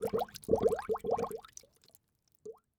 Liquid Water Bubbling Multiple To Single Slow

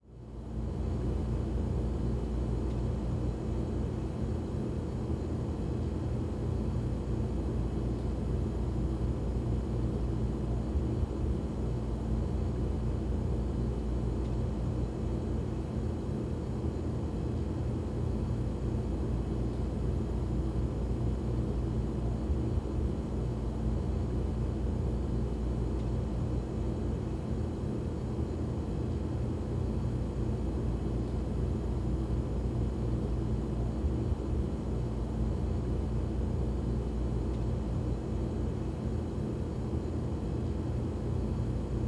Refrigerator Run From Back, Household

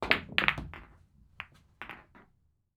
Sports Pool Balls Hitting Balls

